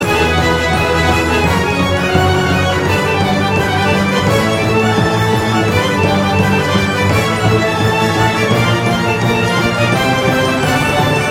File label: Fever Mode Bgm Violinist; Cookie Run